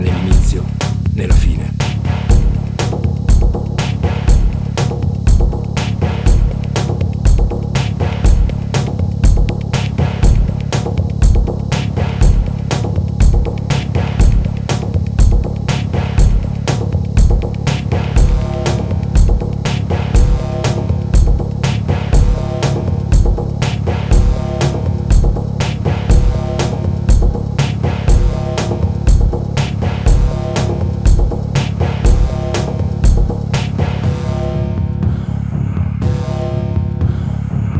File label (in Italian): Tamburi Neri